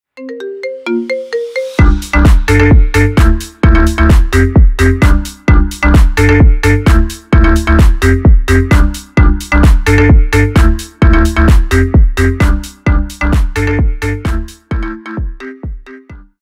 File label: brazilian phonk house ringtone